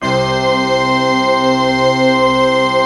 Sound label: pipe, pipe organs; Greytsounds VOL-1